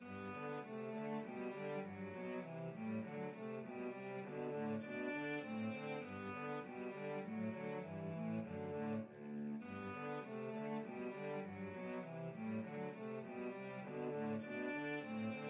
melody cello duet; schumann

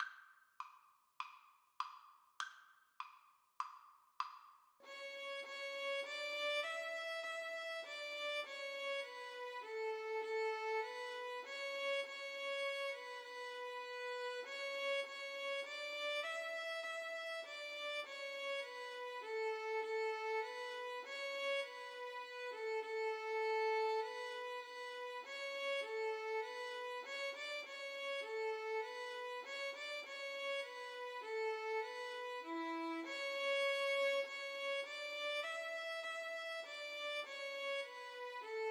violin duet

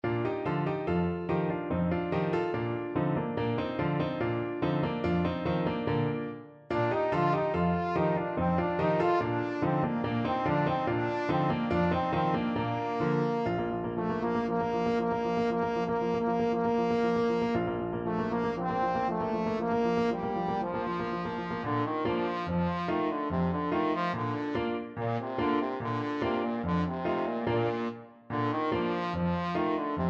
trombone

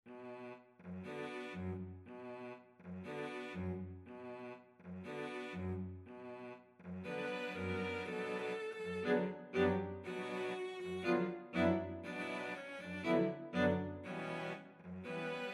habanera, cello ensemble

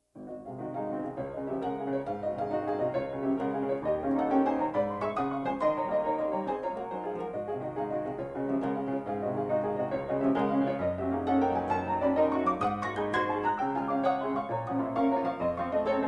Etude, piano

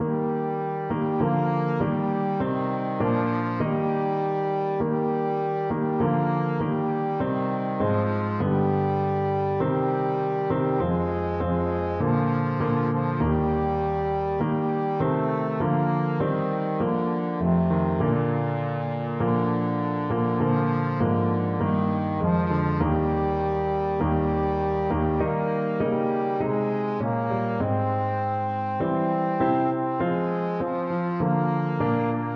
trombone

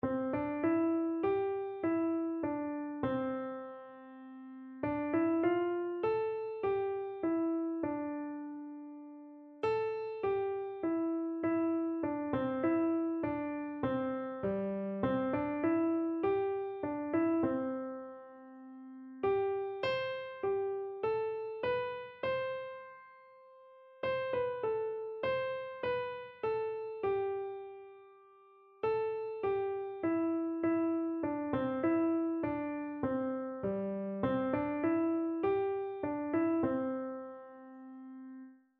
my fathers world, keyboard

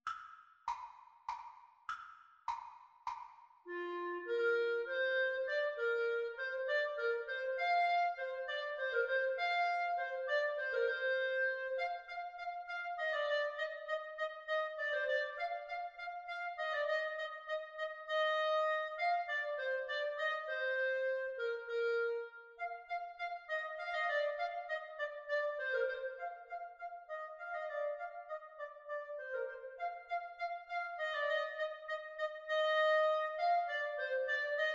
water music, Clarinet Tenor Saxophone

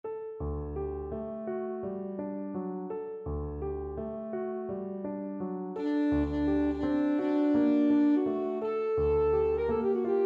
saxophone; de falla; nana

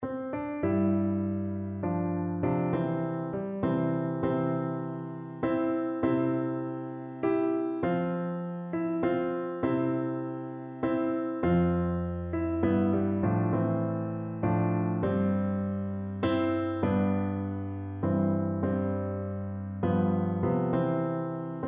Shenandoah, Tuba

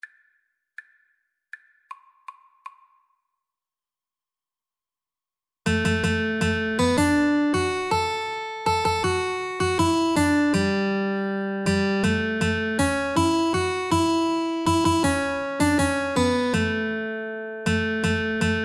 wabash, Guitar Duet

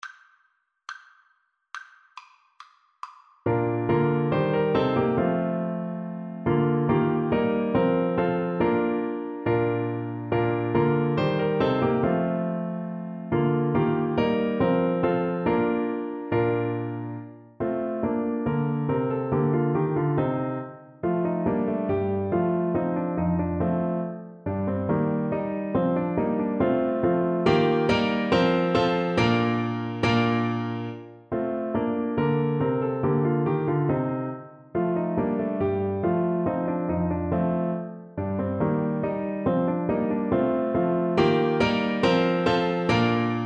Tuba

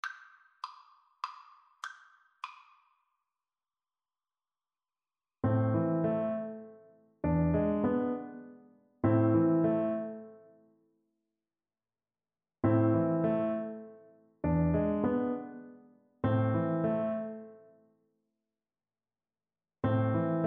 fur elise Euphonium

